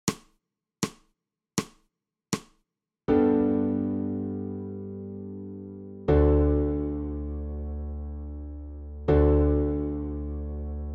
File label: SOPRANO